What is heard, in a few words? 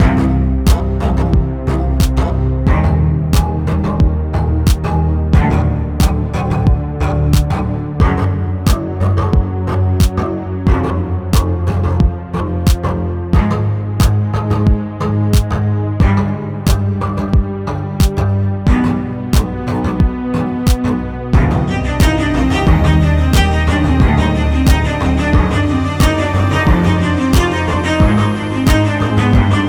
Suspense; Verona